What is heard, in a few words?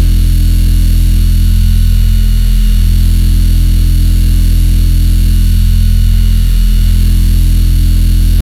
generate a spaceship thru; spacecraft